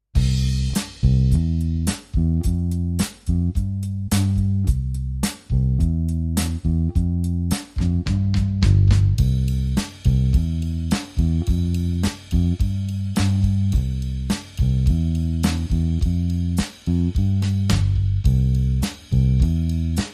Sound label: Rising Bass